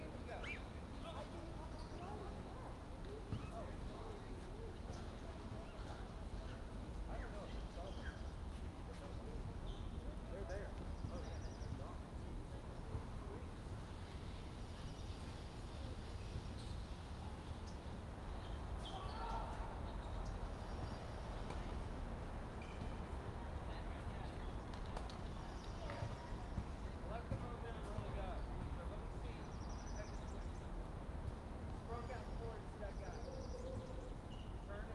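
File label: exterior day city park distinct tennis lesson voices close tennis birds distant basketball; distant traffic; fvtt sonniss gdc audio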